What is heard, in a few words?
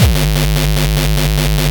badman reese, Neuro Bass; GranularSumo Neuro Bass Pack 01